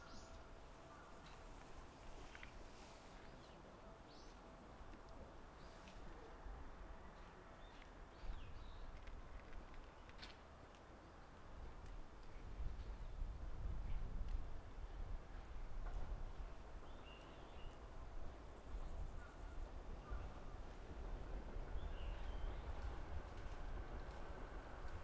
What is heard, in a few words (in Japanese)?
FRONT NORM, 上り電車通過。; H2essential MS内蔵マイク指向性90°＋; ZOOM ヘアリーウィンドスクリーン WSH-2e; 録音したファイルを、本体でノーマライズしました。; ZOOM F6 MultiTrack Field Recorder